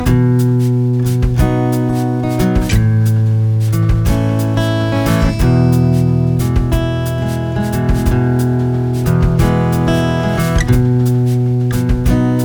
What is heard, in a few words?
wet train rain chords in a row